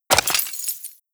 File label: debris pottery, bullet impact debris